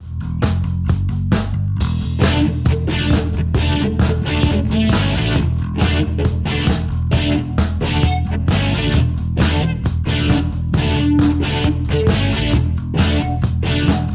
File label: guitar